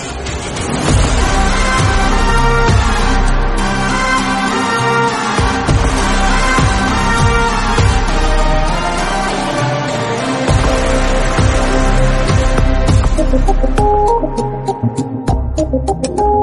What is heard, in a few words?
Father Love BGM